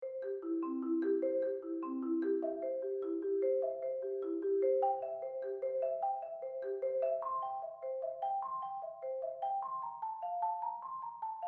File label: Front Ensemble Triplet Arpeggio Warm-up, Percussion; Racine Scouts 2017